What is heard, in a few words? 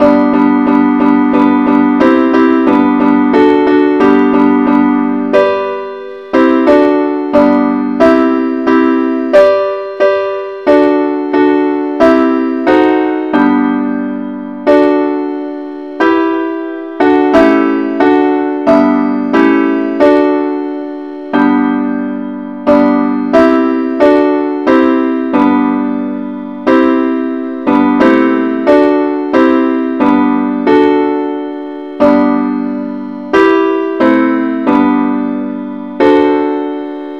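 simple accompaniment, key g; Father, we praise Thee, now the night is over; christe Sanctorum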